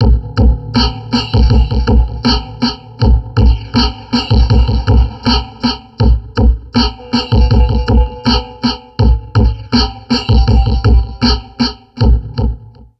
Drum Loops Crazy Processed

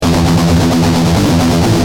G Jam, Guitar; Industrial

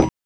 PNO, Electrics, Rhodes

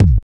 CYBER KIT, DRUMS

